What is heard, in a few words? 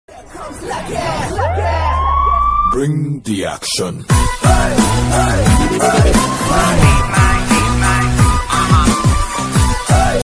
song ringtone romantic ringtone